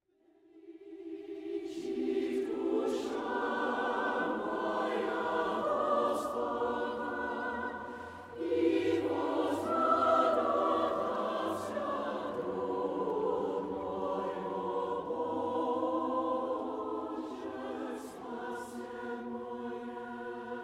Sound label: Chorus; Bel Canto Chorus